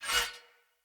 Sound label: Short Sword Whoosh Accent; Weapons; Swords; Sollos